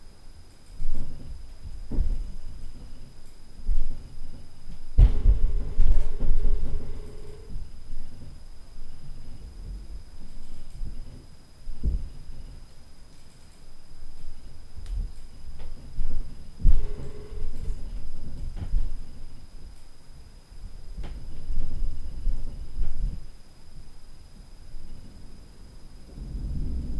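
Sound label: Heavy Drop & Drill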